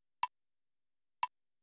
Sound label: eighth note mm 84